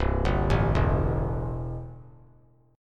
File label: C 6th C down, chord; C6 arpeggiated